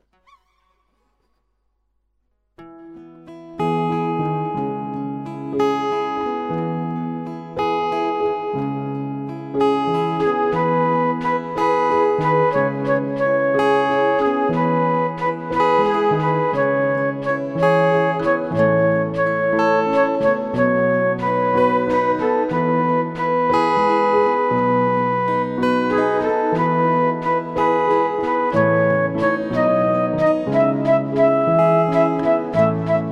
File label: Halle instrumental